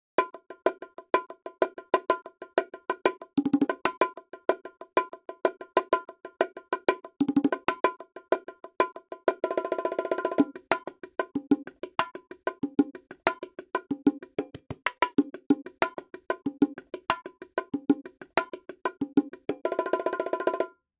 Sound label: reggae